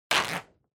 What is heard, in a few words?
Calendar Tear